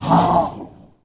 minotaurus lord damage, monster

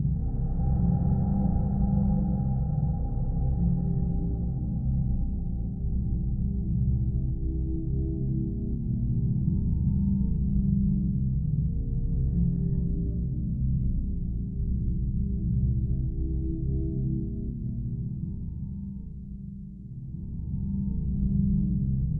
vampire, undeadtower

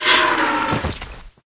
mush zard dead, monster

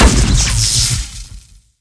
machine damage, monster

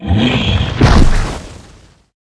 stone dead

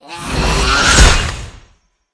snake attack, monster

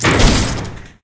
mech spider damage

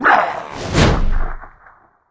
troll attack, monster

